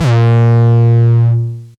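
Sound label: 808 distorted, A#2, kicks; Mbase 11 Kicks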